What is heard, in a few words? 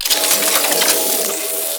Reload; Warframe; Weapons; Acceltra Prime